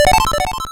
Rank Up; Super Mario Bros. Remastered

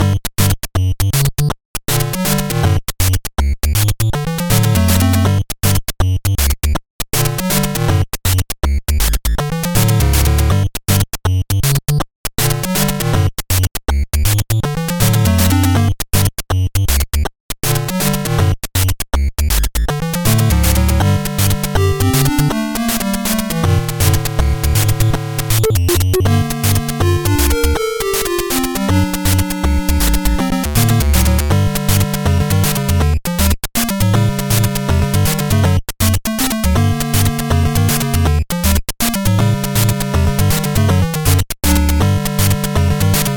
Pipe Land, BGM; Super Mario Bros. Remastered

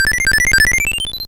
Timer Running Low; Super Mario Bros. Remastered

